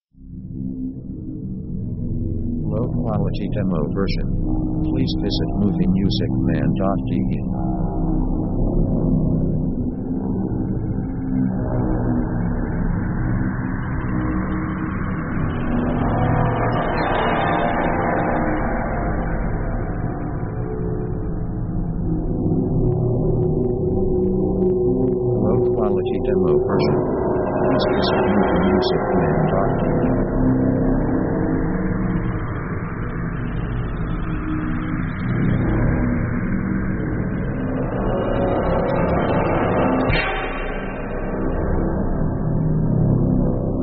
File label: synth horror escalation; Dark mood